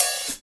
open hat sample f key

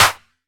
reverb clap one shot b key

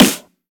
snare one shot a sharp key

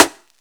dry steel snare drum d sharp key; snare drum samples

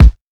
tight bass drum single hit a sharp key

